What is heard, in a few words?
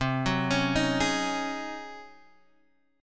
C sharp Major 7th Suspended 2nd Suspended 4th, chord